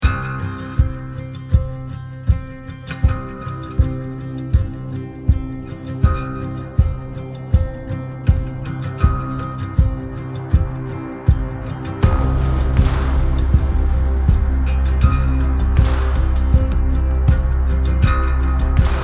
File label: loop; the lone ranger